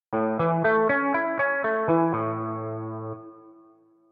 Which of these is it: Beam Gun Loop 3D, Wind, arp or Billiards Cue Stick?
arp